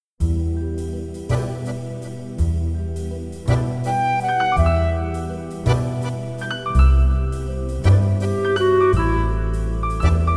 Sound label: Dialogue Romantic; Miraculous Rise of the Sphinx